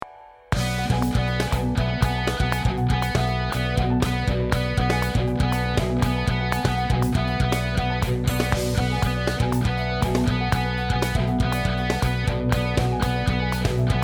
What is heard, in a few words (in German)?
Neckbreak Beispiel